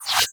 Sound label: Fast Travel Map Open; Open World; Warframe